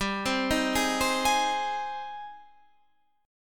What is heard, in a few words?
Ab Major 7th, chord